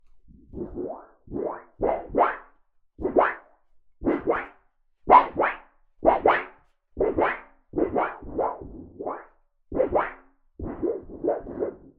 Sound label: Storage Heater